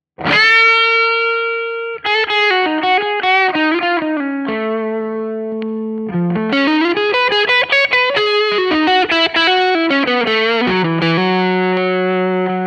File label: characteristic sound; jensentone